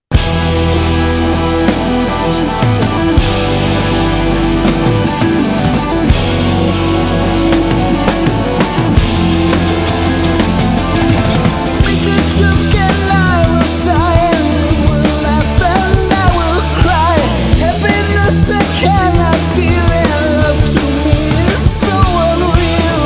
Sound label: Rock Band; Las Vegas